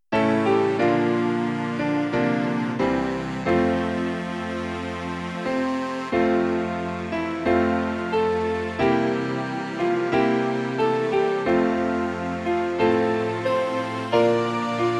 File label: Christmas Auld Lang Syne; backing track